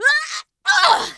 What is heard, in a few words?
Air Bail Female